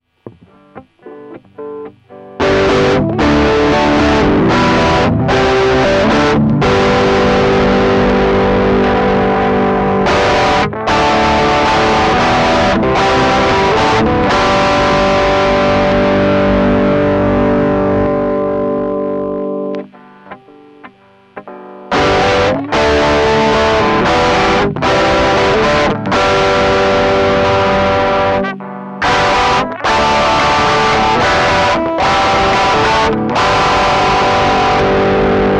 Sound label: higain chord